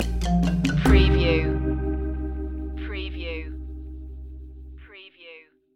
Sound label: tech acoustics stinger